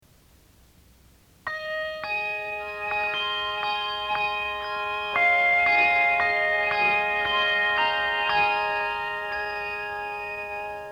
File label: Radio Interval Signal; Transworld Radio Bonaire